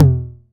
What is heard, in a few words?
Tom 909 Hi; gate experiments